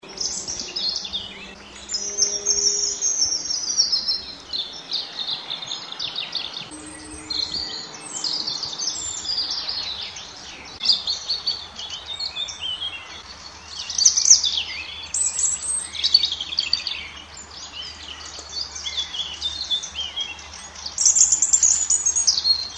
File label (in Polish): rudzik, ptaki